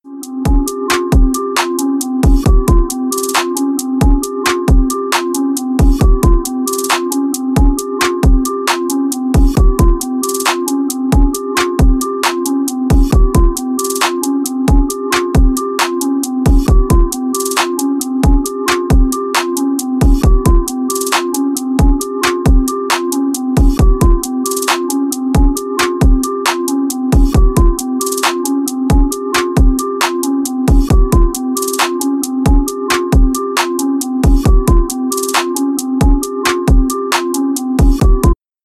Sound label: City Trap; Brooklyn